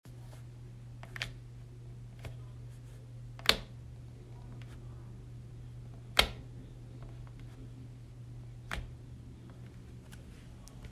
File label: Electric Switch